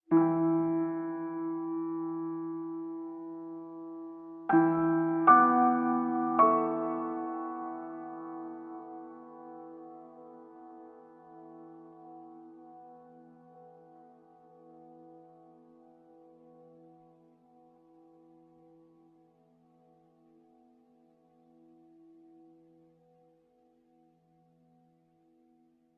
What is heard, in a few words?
tune